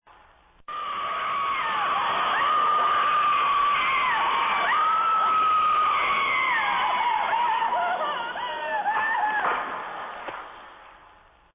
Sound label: blood curdling scream